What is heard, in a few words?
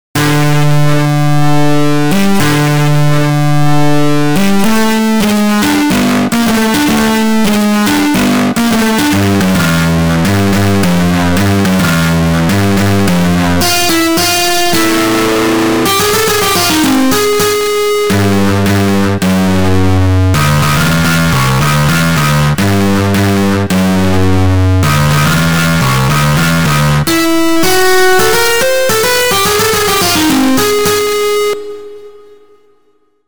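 guitar all overdrive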